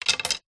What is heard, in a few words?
sport pool snooker cue lay down wood surface